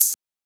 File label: Shaker; FLPRO